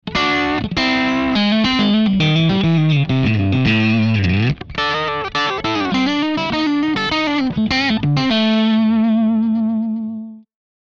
Elec Gtr Preflex Dirty

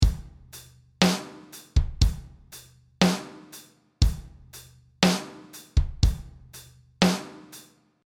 Metal Ballad 60

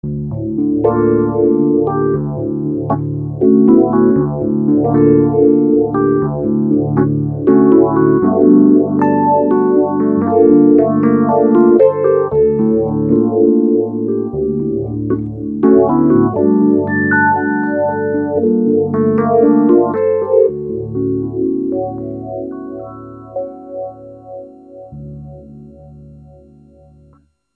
Phaser; Tine EP

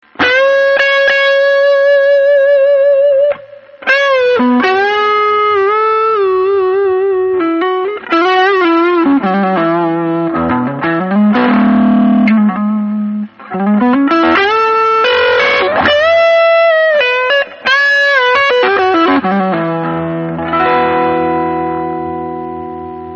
battery amp

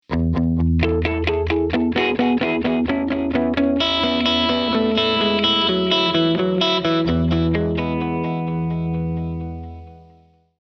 ricochet reverb

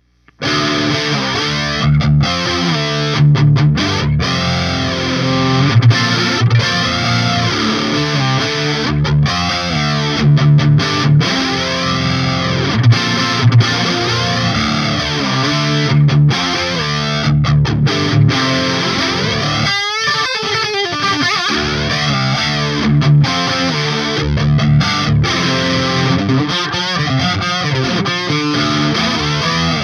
metal flanger

